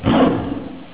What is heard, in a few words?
tiger; India the Tiger; Ty Beanie Baby